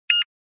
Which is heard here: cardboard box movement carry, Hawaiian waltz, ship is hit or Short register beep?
Short register beep